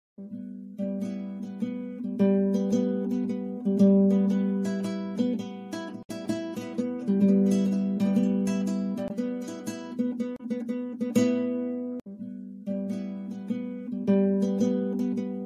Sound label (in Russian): gitara improvizaciya